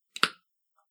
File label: Jar Sealed Lid Pops; Andrew Fleming